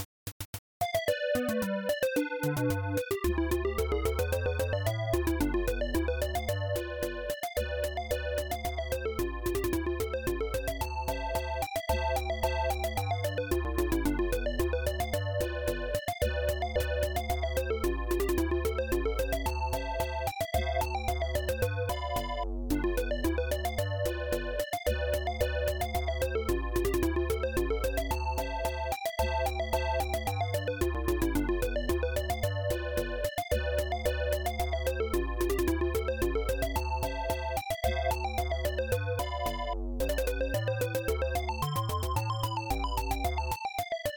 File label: clarinet; adlib